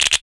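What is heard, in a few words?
PER PROCU, Procussion, percussion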